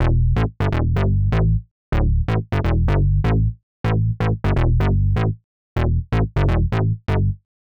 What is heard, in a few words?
Synth Bass E, 125; PowerFX - Nu Jazz House